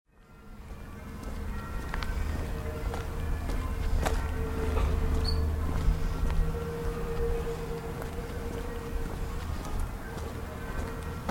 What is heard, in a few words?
Christ Church Bells